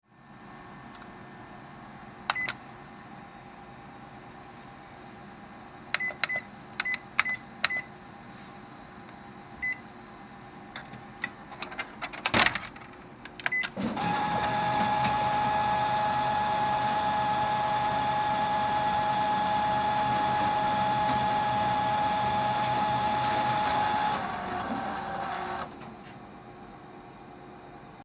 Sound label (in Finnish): Kopiokone